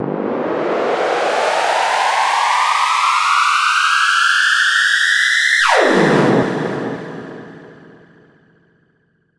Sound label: Uplifter